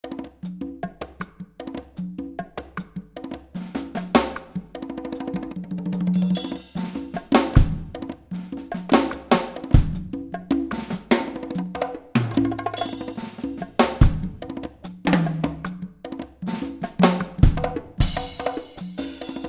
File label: Dyn Perc KIT, xv-5080; SRX